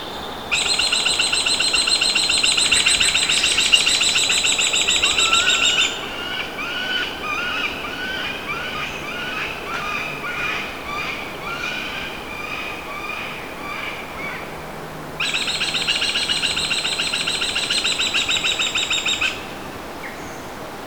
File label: NZ Falcon